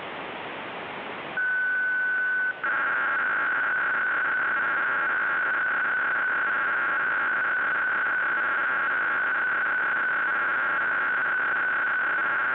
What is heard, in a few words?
US-INTEL 260.41 Bd BPSK (SERIAL); (UHF-SATCOM only)